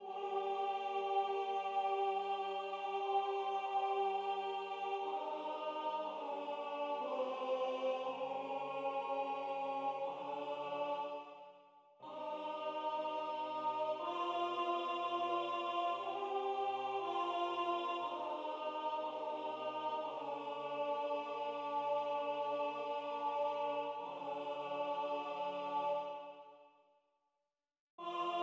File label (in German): Nacht ruht Alt